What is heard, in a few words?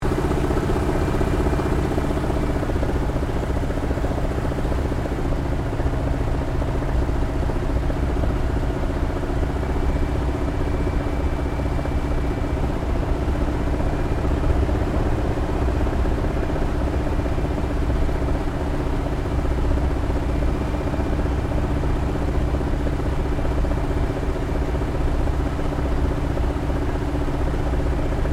AIRCRAFT CAC WIRRAWAY LANDING INTERIOR STEREO; CA-16 Wirraway; Airplanes